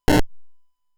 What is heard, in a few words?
Player Shot